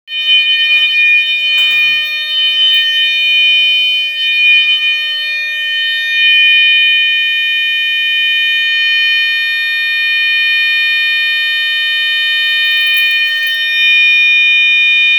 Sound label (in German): Amokalarm